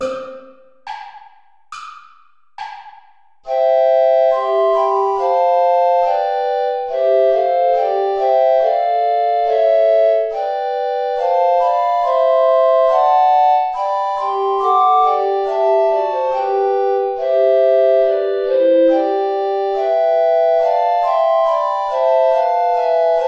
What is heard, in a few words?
lobet den herren alle die ihn ehren play along without bass recorder